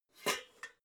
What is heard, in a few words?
pots clank quiet